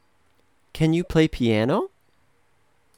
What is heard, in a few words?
piano